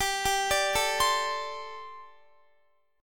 G Suspended 2nd Suspended 4th G, Guitar, 4F, 4A, 5C, 5G, 5C, 6E; arpeggiated